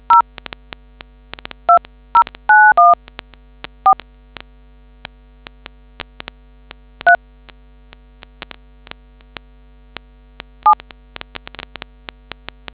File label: dtmf